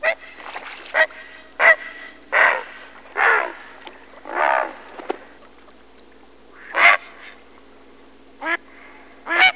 great blue heron, Bird Calls; Ornithology

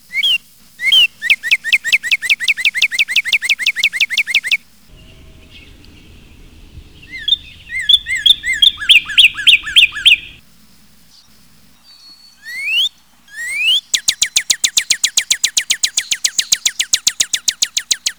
cardinal, Bird Calls; Ornithology

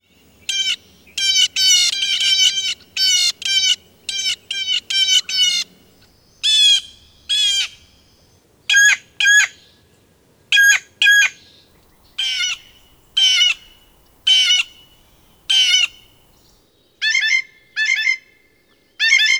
blue jay, Bird Calls; Ornithology

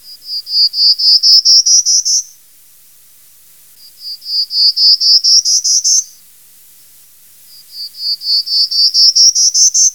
prairie warbler, Bird Calls; Ornithology

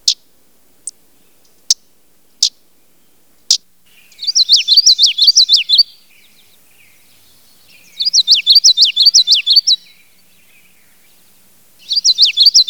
common yellowthroat, Bird Calls; Ornithology

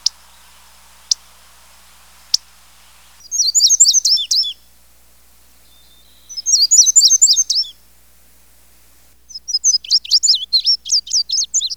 indigo bunting; Bird Calls; Ornithology

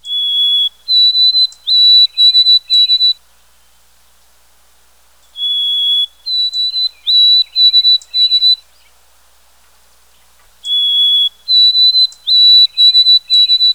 whitethroat sparrow, Bird Calls; Ornithology

